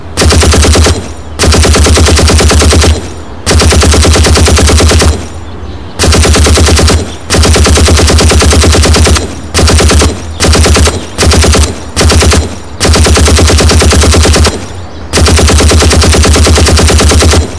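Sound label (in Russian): ochered vystrelov iz pulemeta, machine gun burst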